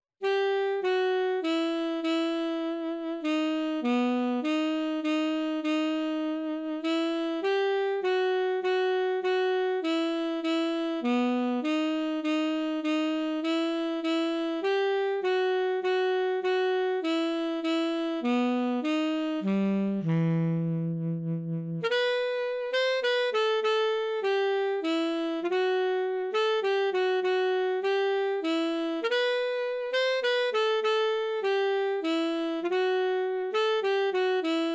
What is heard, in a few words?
Blue Wyes Waltz Sax